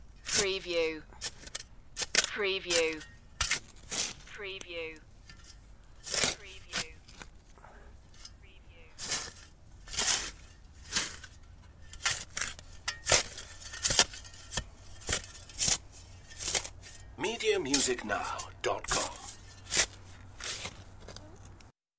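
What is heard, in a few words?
Shoveling Sandy Dirt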